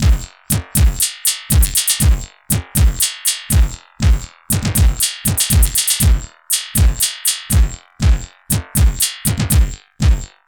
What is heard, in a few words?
Structured Rhythm, IDM Rhythms